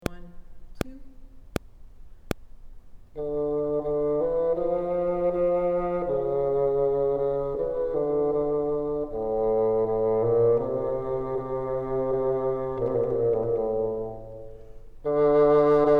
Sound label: top, bassoon; A trill is a musical ornament (as is the grace note) consisting of a fast oscillation betw